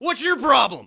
yell; Matt Hoffman's Pro BMX 2; XBOX

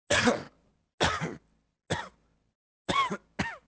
cough; Soldier Of Fortune II Double Helix; XBOX

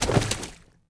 bodyfall mud, foley

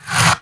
kid scrape

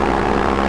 Loop, Engine, Spitfire

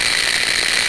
Static

